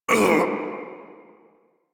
Pain Lich D; Kuva Lich

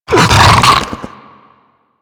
Infested Ancient Run Breathe, Enemies; Warframe

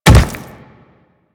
Step Default; Leto

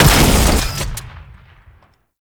Flak Cannon Fire; Warframe; UT Flak Cannon

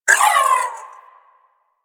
Briefcase Akimbo Pistol Alt Proj Whizzby; Warframe; Weapons; Corpus

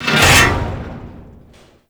Mech Head Impact; Nechro

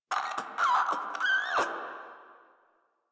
Infested Crawler Breath; Warframe

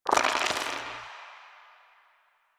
Dice Roll Five; Warframe; Koumei

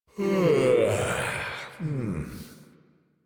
Long Sigh; Warframe; Deimos; Father; Vocalizations; en

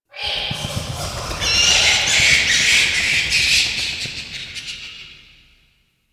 Bats; Warframe; Clan Dojo; Dojo Misc; Dagath

